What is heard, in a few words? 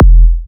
Minimal Kick, Drums, Kicks